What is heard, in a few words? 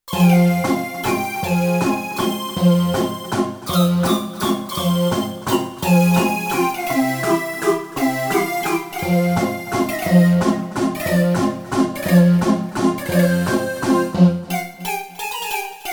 Doo Pipes, Roland JD-800; Factory Patches